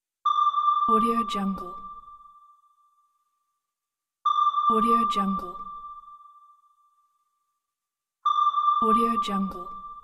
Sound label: sonar beep